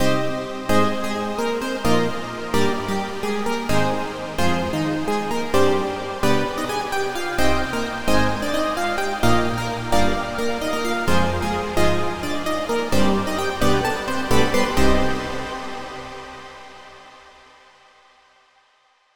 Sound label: synth mix